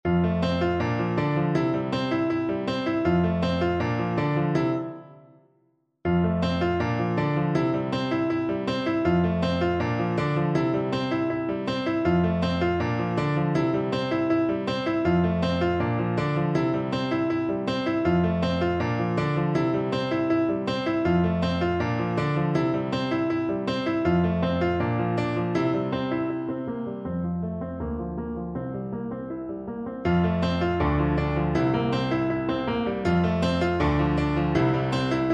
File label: la bamba, Bassoon